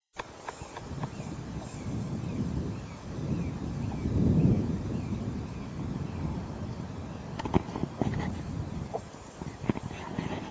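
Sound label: honey buzzard alarm; south tyne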